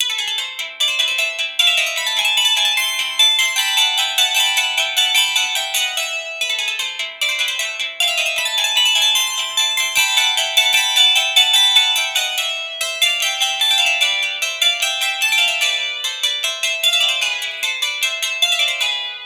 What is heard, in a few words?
Prop Cell Phone Ring; battlefield hardline; Khai